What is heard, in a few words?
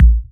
Soft Kick; Vengeance Samples